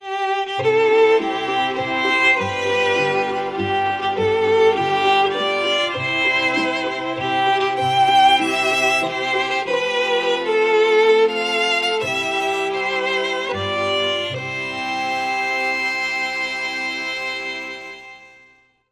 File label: String Quartet Happy Birthday